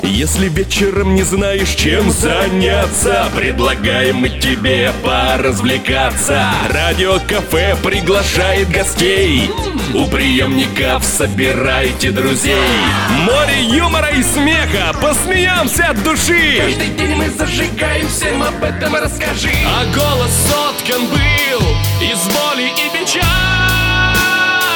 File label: voc rus